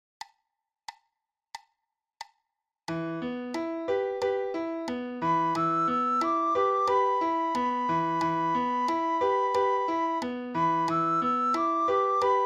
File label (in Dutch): sopraan; Blokfluitles